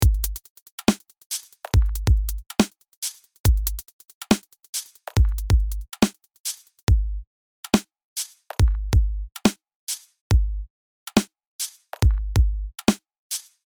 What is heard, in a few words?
dubstep drums